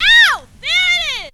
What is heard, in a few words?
VOX; Zero G; Man Machine